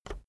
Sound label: keyboard external enter push soft